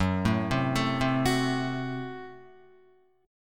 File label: Gb Diminished Guitar; Gbdim arpeggiated; 1,0,0,2,0,2